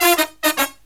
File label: Brass Riffs; USB Soundscan vol.29 - Killer Brass Riffs; AKAI